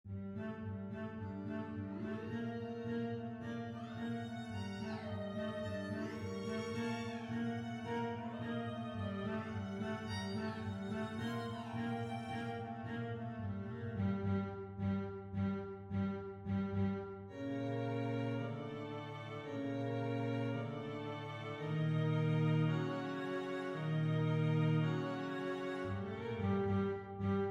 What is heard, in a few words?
String Quintet midi realization